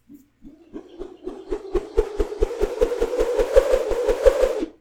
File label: swinging rope